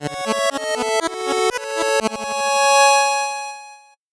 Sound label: 8bit jingle fast